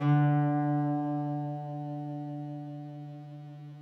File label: s guitar cello; interpolator